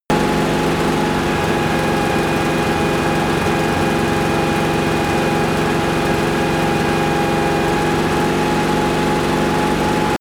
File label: tank medium engine fast speed steady